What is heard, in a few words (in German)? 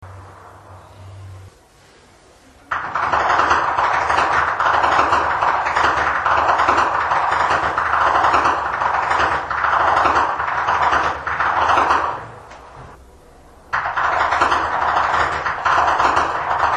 schnalzen, flachgau; bergheim